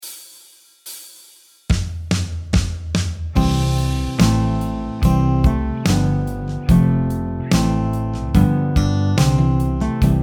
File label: Eighth II Back Track, marimba; metodo de marimba